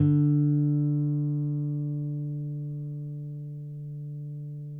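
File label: nylon, FLAMENCO, Guitars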